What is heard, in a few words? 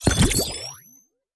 respin progress; amazon-city-of-gold